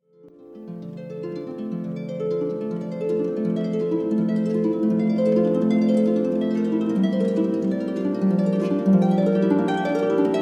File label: Fountain, harp solo; Harp Wedding Music; Ann Arbor, MI; White Pines Entertainment; Wedding music selection, played by our solo harpist